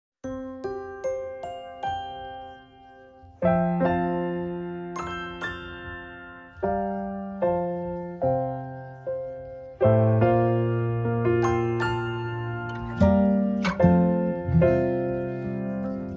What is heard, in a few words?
Instrumental; hazbin